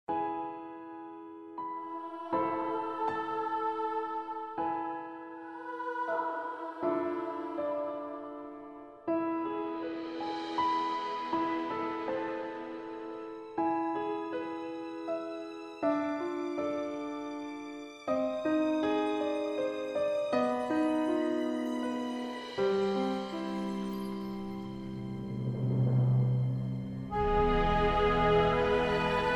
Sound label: introduction 115bpm, Intros; hartwigmedia